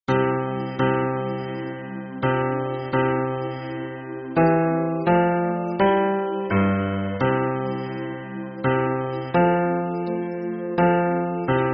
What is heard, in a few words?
hymn bass